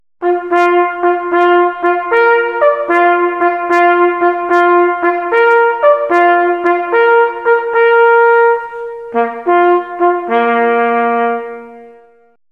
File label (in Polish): Krolik na rozkladzie